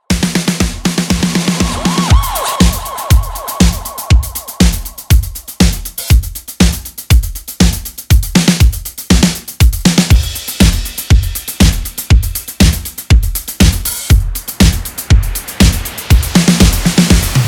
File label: DRUMS ONLY; Trailer